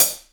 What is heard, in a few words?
rich top end hi hat a key